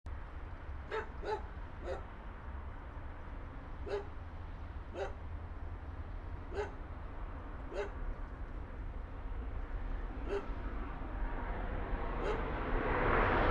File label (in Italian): Treno; Eigenmike; ComunePR; NuovaStradaF